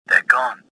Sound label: escaping; worker1